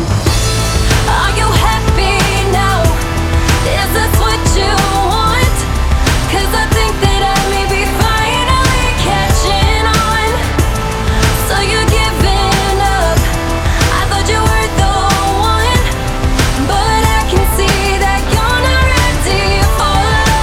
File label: Chorus